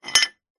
foley wall tile small set down on concrete; zapsplat

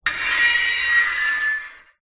metal fork scrape garage door shrill squeak; foley

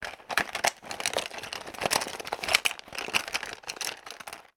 office pencil case rummage; sound effects one

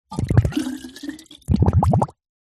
metal canister small submerge deep water bubbles; zapsplat

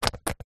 household hair gel pump dispenser push empty; zapsplat

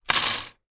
household plastic cutlery many down on surface; zapsplat

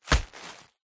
luggage leather handbag hit thud; foley

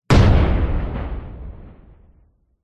punchy explosion with short tail

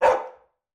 dog labrador single bark internal

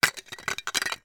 christmas tree decorations clang together; foley

